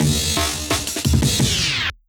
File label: Fill, breaks; M8